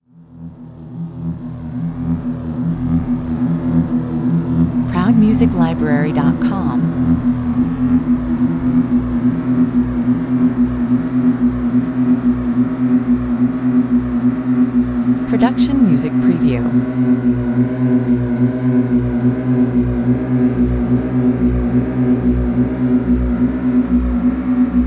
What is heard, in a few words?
misanthropy 144 bpm; Über Menschen die grundsätzlich schlechte Laune haben. Und andere dafür verantwortlich machen. Schräg und verharkt